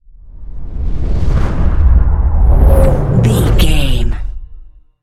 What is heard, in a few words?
cinematic deep bass pass whoosh